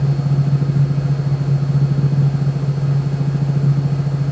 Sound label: crawler steady, vehicles